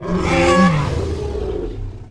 charger; giants

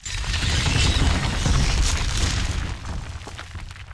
eaten; permanentaliens; giants

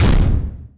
jetski; giants

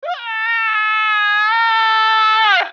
SCREAM; giants

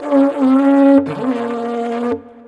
lose, jetski; giants

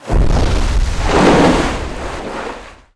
jetski; giants

